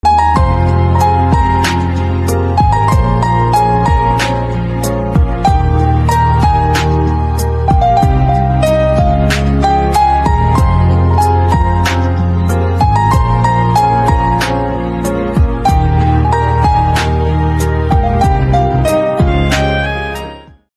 Piano Instrumental

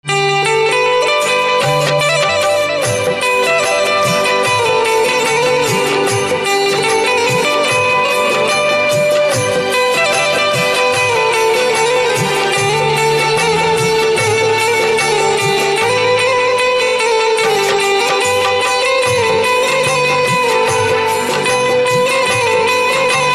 Banjo Instrumental Ringtone

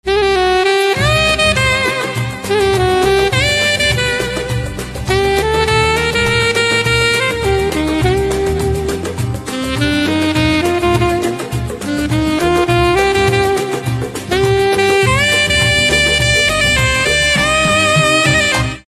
Saxophone Instrumental Ringtone

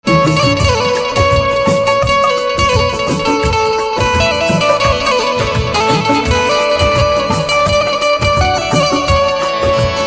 Banjo Ringtone

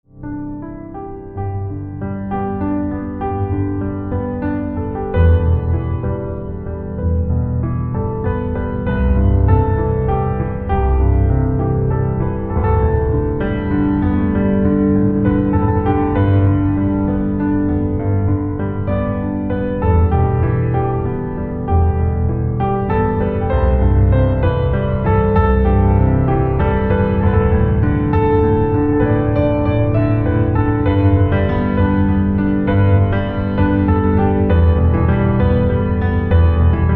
Piano; english instrumental ringtone

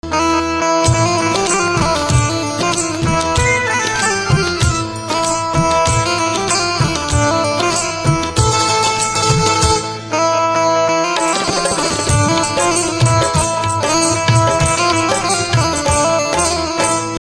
Banjo Instrumental Ringtone

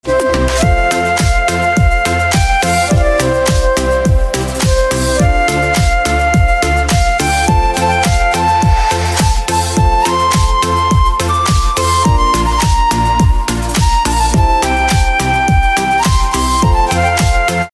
Pianica Instrumental Ringtone

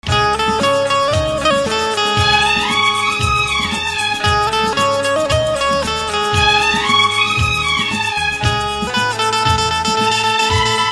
BANJO Ringtone

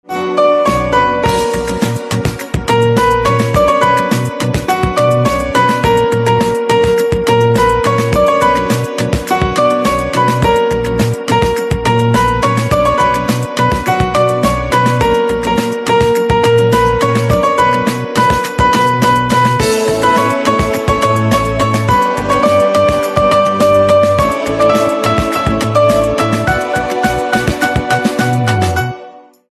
Piano Instrumental Ringtone

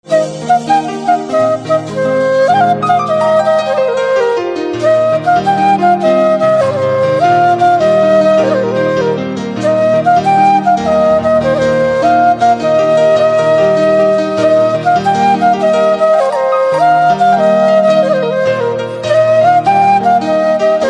Flute Instrumental Ringtone

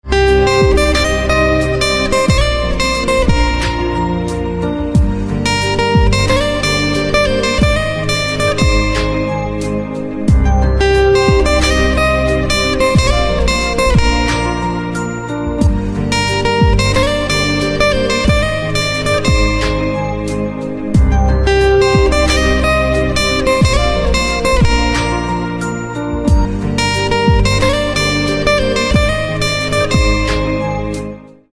Guitar Instrumental Ringtone

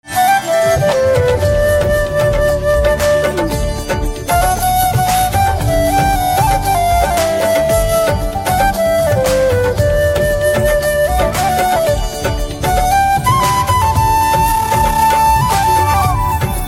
Flute instrumental Ringtone

